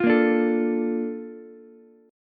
Bb Major 7th Ukulele, chord; BbM7 strummed; JGuitar

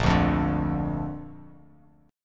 A sharp Major, down, Bass Standard; strummed; chord; JGuitar

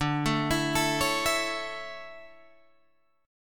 D Major 7th Suspended 2nd Guitar Drop D, chord

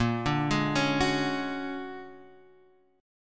Bb 7th Sharp 5th Sharp 9th Bb; Bb7#5 #9

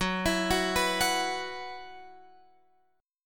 F sharp 7th Suspended 4th, Guitar, Open F; arpeggiated; x,13,16,13,14,13; F#7sus4 Chord

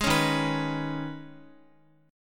Eb 7th Suspended 4th Sharp 5th, Guitar; Eb7sus4#5; strummed

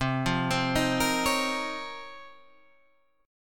Db Major 13th C

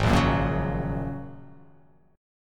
G sharp 7th Suspended 2nd Suspended 4th

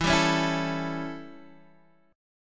A 7th E, chord

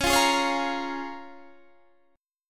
C sharp 6th

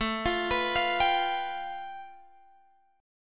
A 7th Suspended 2nd Sharp 5th, Guitar, DADGAD; 19,20,21,22,22,x

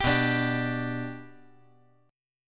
B Minor Sharp 5th B

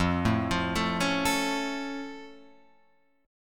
F Augmented F Guitar Open F; arpeggiated

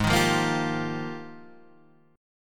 F Minor 11th, guitar chord

